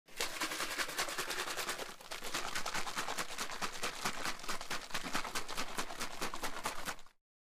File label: shaking can